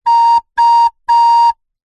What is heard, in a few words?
musical instrument recorder 3x toots med pitched